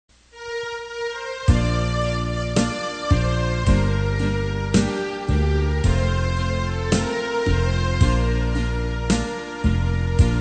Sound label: bass